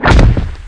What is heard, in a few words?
launcher down, weapons